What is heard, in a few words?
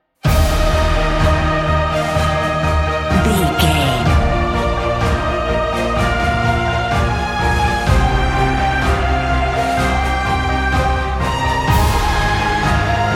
cinematic corporate